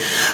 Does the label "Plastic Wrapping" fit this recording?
No